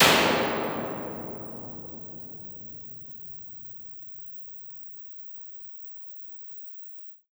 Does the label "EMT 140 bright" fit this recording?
Yes